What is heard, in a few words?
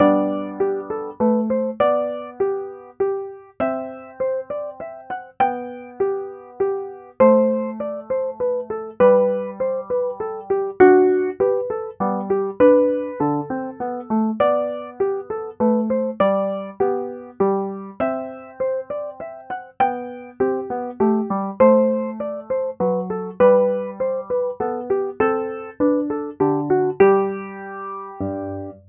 piano; minuet